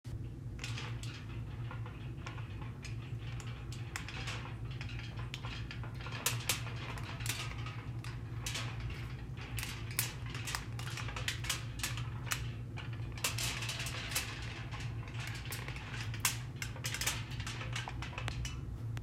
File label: Aluminum foil